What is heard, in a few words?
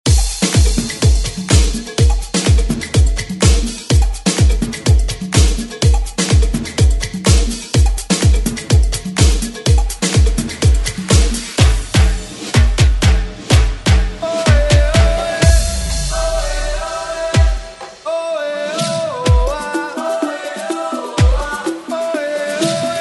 80s Club ReWork